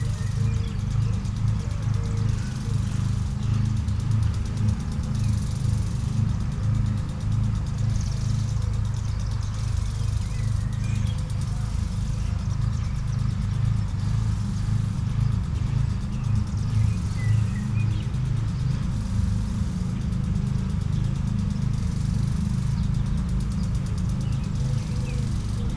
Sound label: Suburban day birds sprinklers mowers; boom library